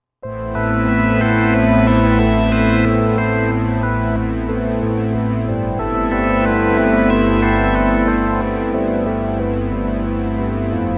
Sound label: Random Pad, vintage synth; Roland SR-JV80-04